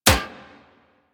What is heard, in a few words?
Rail Jack Hit Notification; Enemy Ship